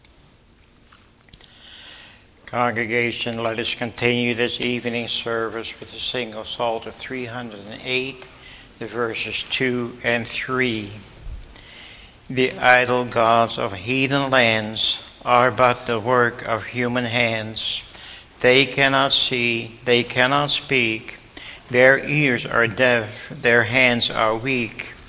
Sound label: Singing